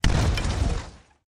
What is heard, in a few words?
Cannon impact sounds (Hitting ship); SNKRX